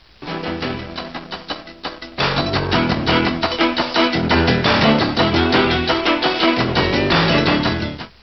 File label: News Intro, Cheesy Lo-Fi Sound Effects